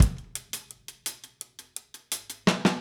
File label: Drums, 70s Analog Funk